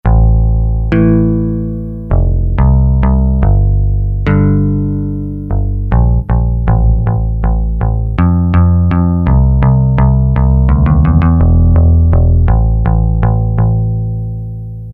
Yamaha TG500, BA Pick

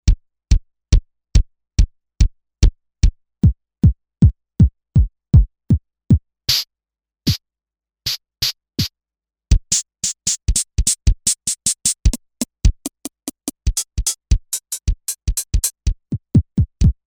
TechKit

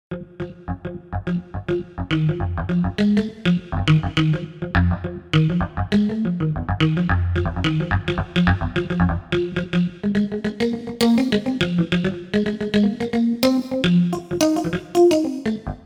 Roland JV-880; Factory Patches; Mix On Mute

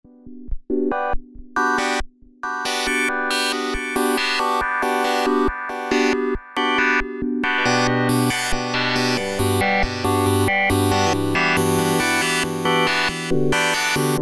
synth; Apple GarageBand 2; SYNTH BASICS; Circuit Dialog

